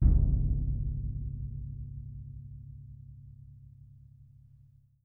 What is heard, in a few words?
B D, Percussion; Miroslav Vitous - Percussion & Harp